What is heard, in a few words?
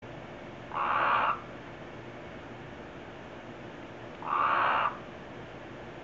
Colorado River Toad Incilius alvarius mating call